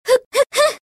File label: hit; kasumi